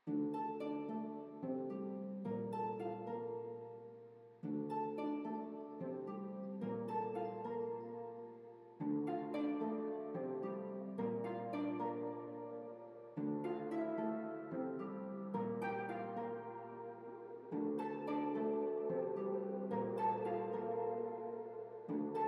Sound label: atmo